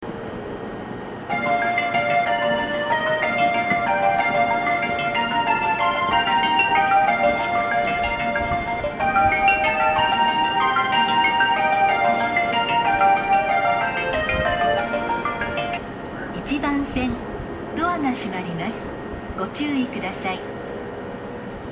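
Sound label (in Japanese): usami hassya, departure melody; 発車メロディー; １番線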